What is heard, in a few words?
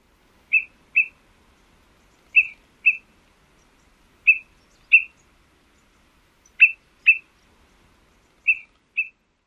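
Greater Pewee, pip-pip call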